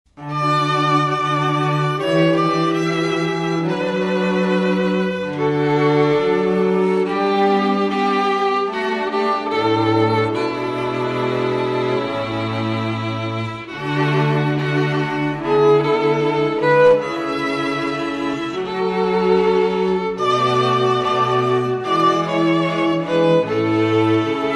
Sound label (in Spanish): pompa y circunst fl cuerdas; Pompa y Circunstancia – Elgar1